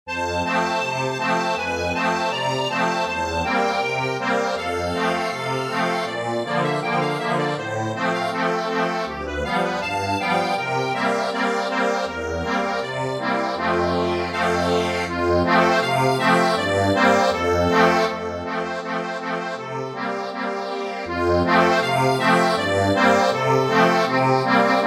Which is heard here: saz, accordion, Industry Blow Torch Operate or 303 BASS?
accordion